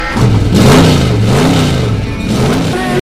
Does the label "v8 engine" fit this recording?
Yes